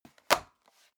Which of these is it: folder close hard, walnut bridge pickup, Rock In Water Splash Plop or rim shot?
folder close hard